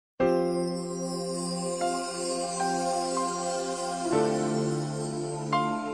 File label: Music Msg